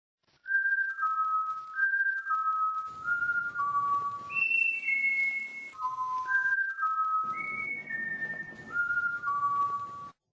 Whistle; Neagans

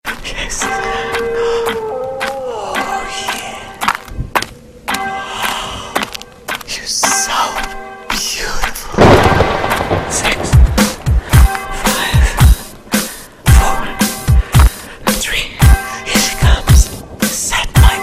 Horror Tone

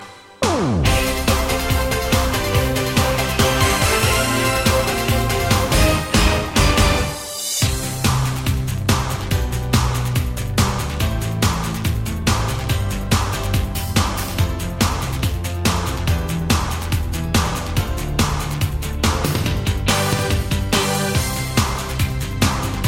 Karaoke

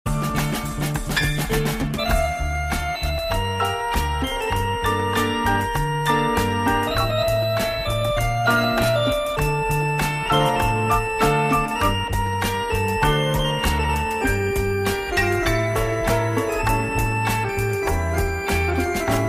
Slow Tone

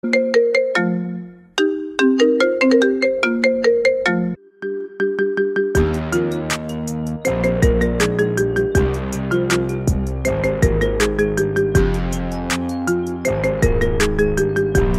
Ring Ring Marimba

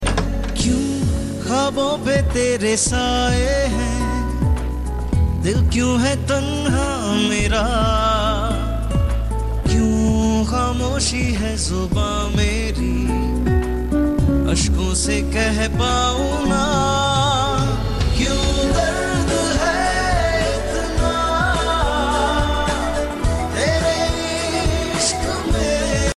Sad Love

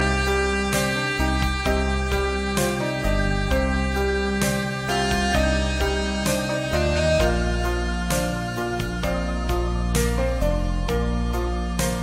Hmong

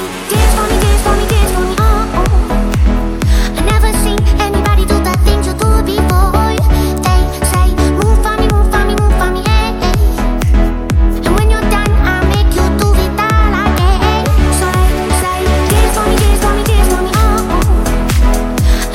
Remix

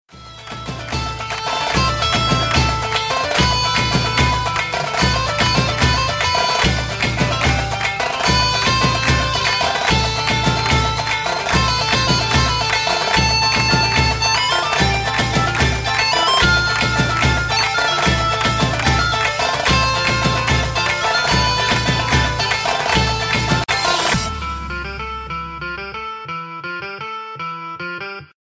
dhol

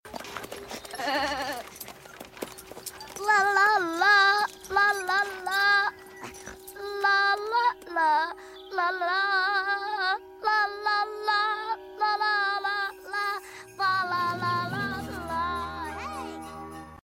pig song lalala; Babe

